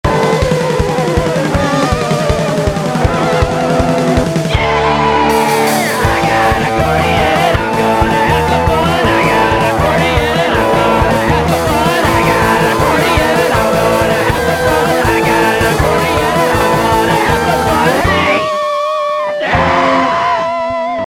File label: accordion